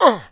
Grunt; TR3; Playable Tutorial Level

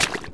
Footsteps Mud; Lara; Playable Tutorial Level

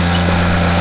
Cleaner Loop; Playable Tutorial Level; TR3

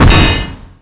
Warrior Landing; Playable Tutorial Level; TR2

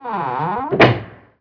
Hand Wheel Creak; Playable Tutorial Level; TR2

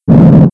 Low Rumble Lower; Playable Tutorial Level

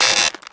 Skel Trap Part 1; Playable Tutorial Level; TR3

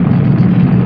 Snowball Roll; Playable Tutorial Level; TR2

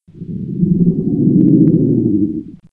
Attack; Swampy

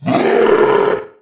Warrior Growl; Playable Tutorial Level; TR2

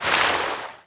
Get out water; Lara; Playable Tutorial Level

